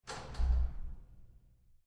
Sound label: Door Bathroom Stall Handling Roomy Lite Short; Big Room Sound